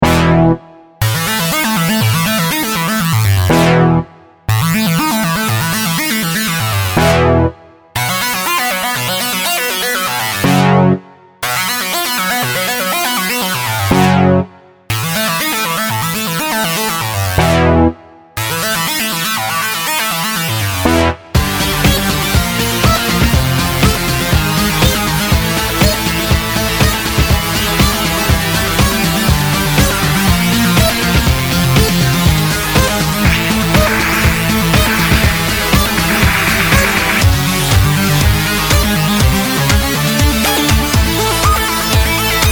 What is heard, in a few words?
Minute Waltz